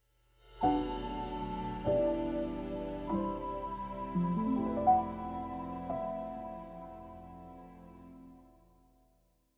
Stinger; Forest Music; Harmony Hermit Meet

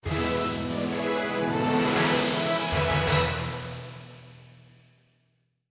Epic Level Complete; Trouserheart; Android

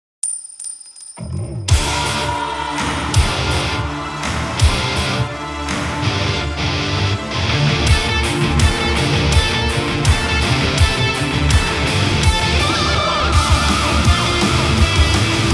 Taiko Disco; Raiko; Taiko no Tatsujin V Version; PS Vita

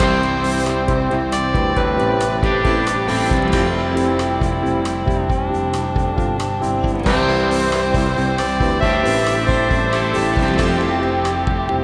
bossanova outro win; Summer Catchers

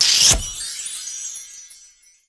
Fx Kissfrog; Levels Hub Mansion 02 Tag Kissfrog; Fx Kissfrog Transform

